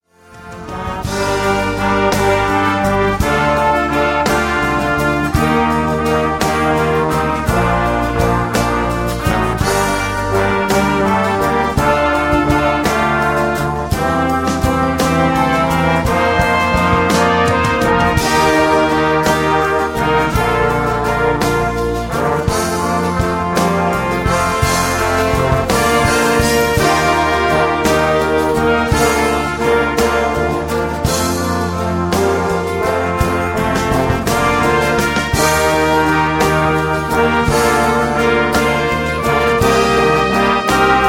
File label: Brass